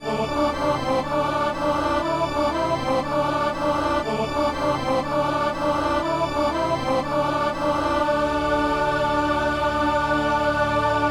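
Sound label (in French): alleluia carillon ténor; Saint-Denis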